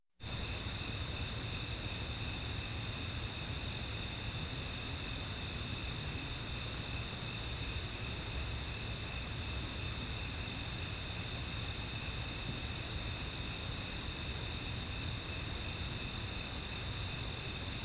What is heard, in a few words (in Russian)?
zvuki prirodi strekot sverchkov